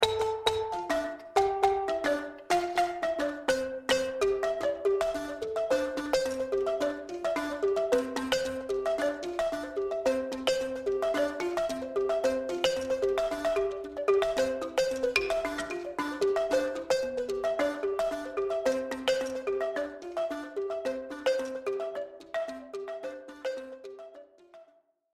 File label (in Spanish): balafon profesional